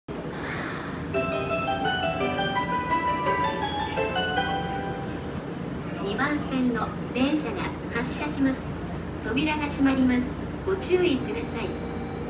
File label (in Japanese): 山電須磨西発車音